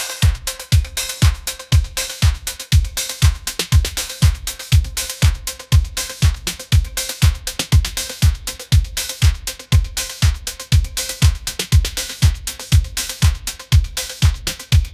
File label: bright pop ringtone hook